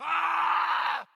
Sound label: dist scream; Zomboid 41.78.16; Project Zomboid